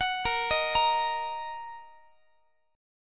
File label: Bb Suspended 4th Sharp 5th Bb down Guitar; Bbsus4#5 arpeggiated